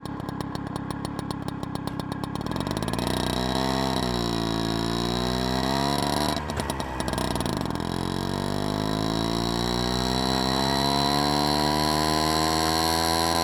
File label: Tunturi On Board Driving Full Speed Exhaust; Soundreorganized; Tunturi Maxi Sound Effects Library